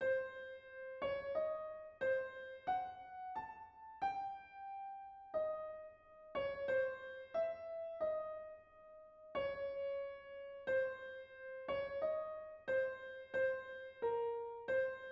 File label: Melody Octatonic